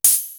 OPEN, CYMB

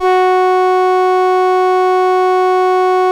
LAMBDA, String Machines

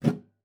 GUITAR FX; Xsample VOL-2

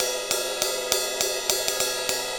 Ride Cymbal Pattern

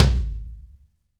TOM, Percussion; MARKYDRUMS

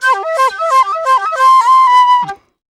Penny 130bpm C, Penny Whistle; African; Quazibeat; African Secret

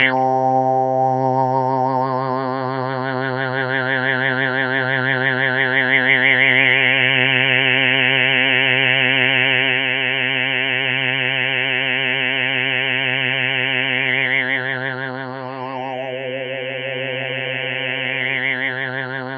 C3, SynthLine; 90 s Sample CDs; NiceBeats; SynthLine VOL-16

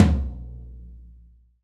TOM, Roland, Real Kit

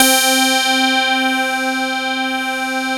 MIDI, OLD PPG; Sound Gallery - Charged

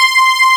STRINGS C5; Big Fish Audio; Synth City

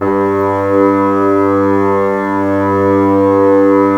Bassoons G 2; Miroslav Vitous - Woodwind Brass Ensemble

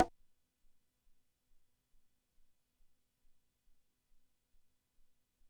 Conga hi, Drum Machines, ELKA X1000; 300 Drum Machines

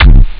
bdrum; tristar; Amiga Soundtracker Sample Packs

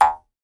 Jaw Harps

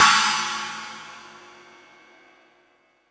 Fusion Stix, E-MU Pro-Cussion; drum machine

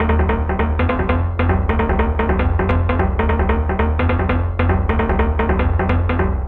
KALIMBISH LOOP, 150; Masterbits - Tekkno Trax; SQ LOOP 150

